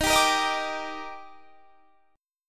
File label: Eb Major 7th; EbM7; strummed